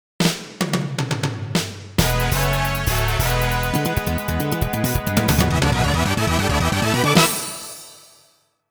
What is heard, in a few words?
Stage Clear; Galaxy Force